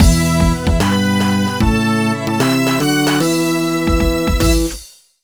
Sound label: Pirate Coin Obtained